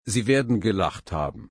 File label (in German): lachen